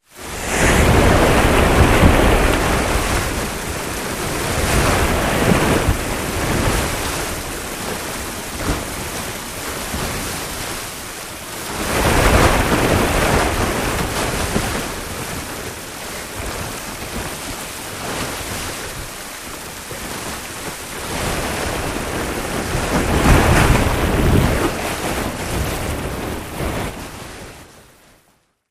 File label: Sequence Hard Water I